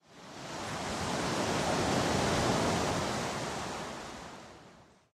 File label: Amb Beach Waves